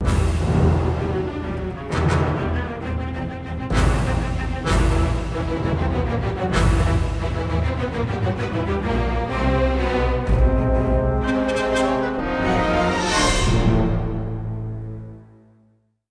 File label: music flourish